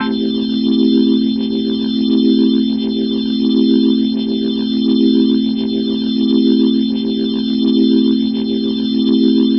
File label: Glowing Organ A Min7, Rock Organ Licks